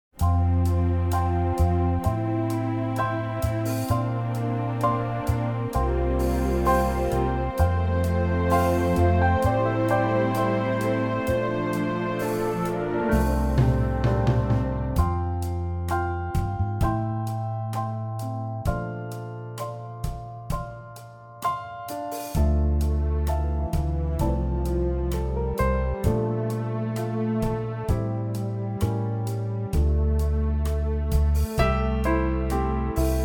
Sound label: F orchestral; key - F - vocal range - F to Bb